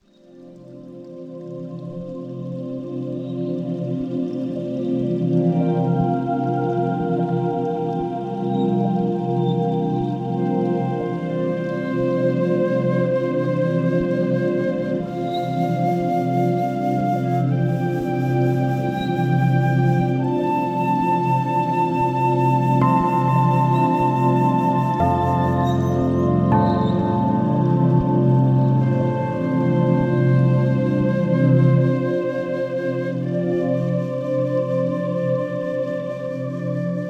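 Ambient Nature White Noise Música Relaxante Massage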